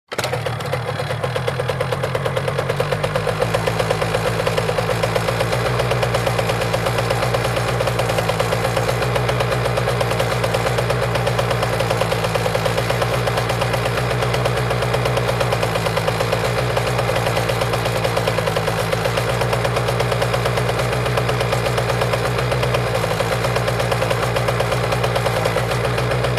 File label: Motorcycle starts, waits, turns off